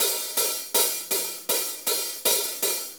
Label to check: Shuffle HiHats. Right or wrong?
Right